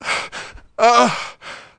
in pain; welder

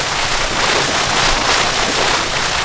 Sand External; Rally Xtreme

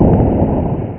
My Fire; dasboot

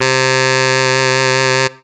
sax, synths

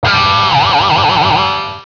Ch Wang

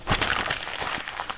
Paper

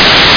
Shield

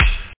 bass drum; OctaMED SoundStudio V1; drumkit; bassdrums

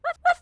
bark; FRACTIONS PIZZA

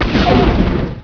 missile; acdemo; AC Demo 3-14-98 RipCord

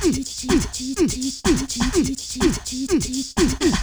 loop; smash

